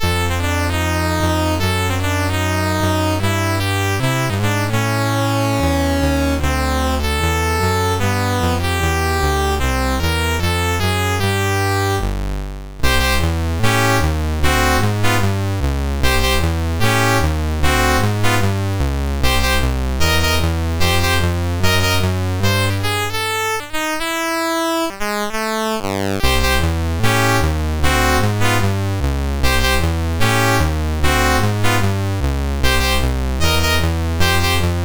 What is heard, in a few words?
Big Band Tune, MOS6581R2